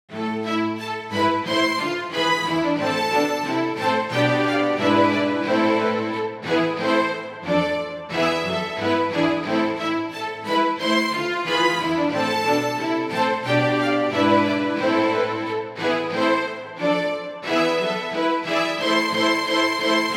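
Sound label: Vivaldi concerto A minor for violin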